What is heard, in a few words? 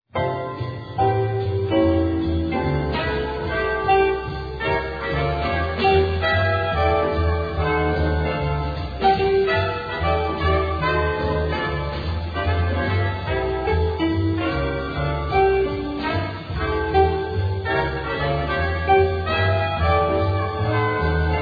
Country Club Swing; Houseboat